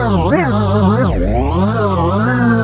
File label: drunk